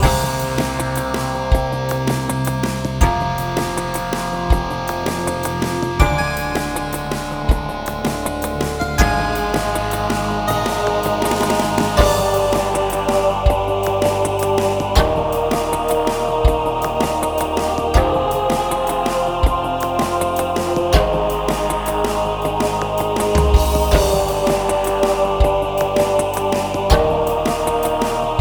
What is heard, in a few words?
Interface Music Loop